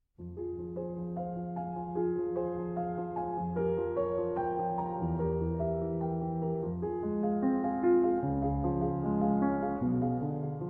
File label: Piano Sonata No. 9 in F Minor, Andante spiritoso